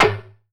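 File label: smack, percussion; Masterbits - Total Zone; ADD.PERC.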